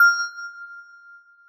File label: Bell F7